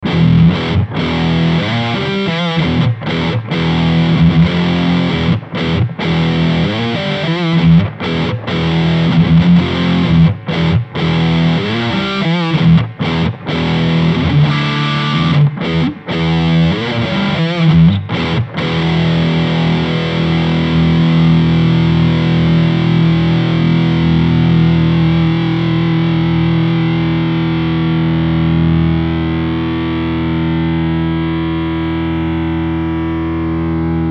mxr super badass distortion max gain bass5 mid9 hi2